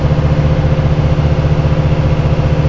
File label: MOTOR, Slot Car